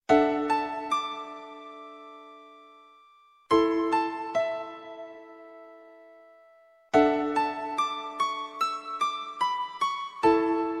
theme short; final fantasy vii aeriths

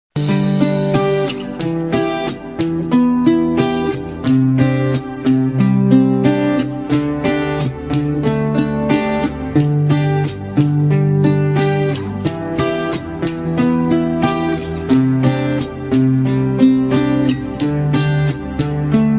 instrumental tone

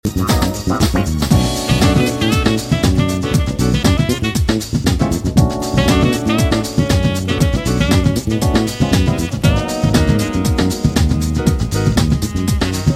freefall

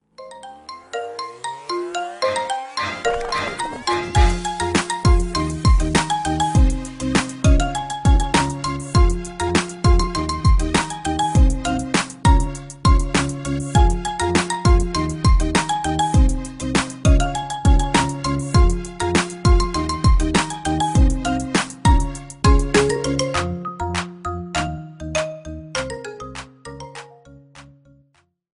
marimba ringtone remix

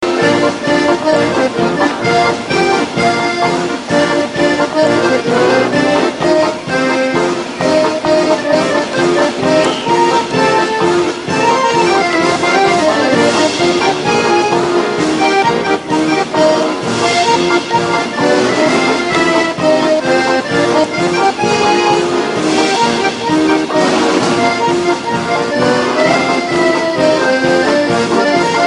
polka

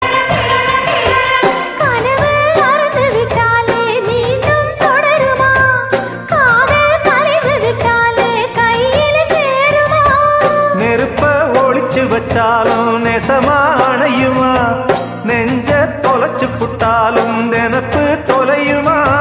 love sad song

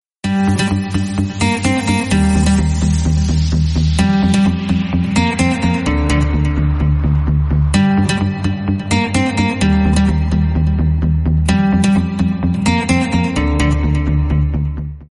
dj bass

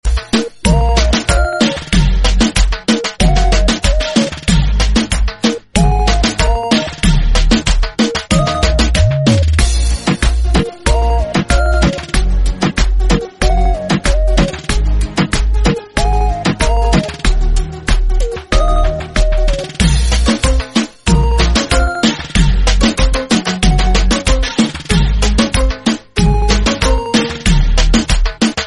reggaeton mix

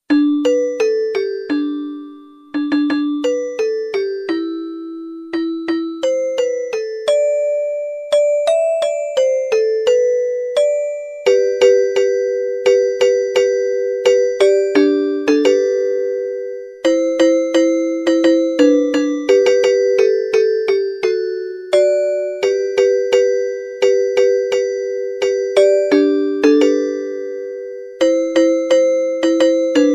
jingle bell ringtone

